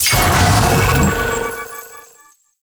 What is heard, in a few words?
activate tower crystal; Warframe; The New War